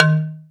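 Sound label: xylophone D#3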